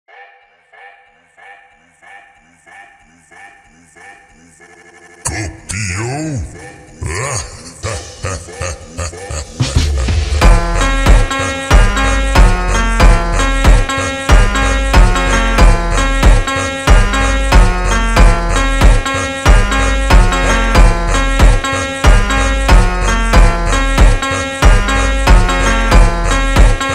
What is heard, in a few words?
brazilian funk phonk slowed reverb